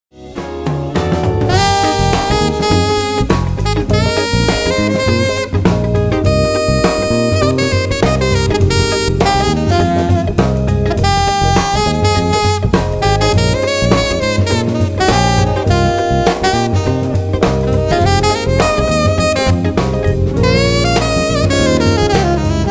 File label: alto; roma; C Guida